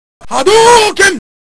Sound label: knife; flash new knife